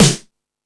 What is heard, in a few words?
Rock N Roll, E-MU Pro-Cussion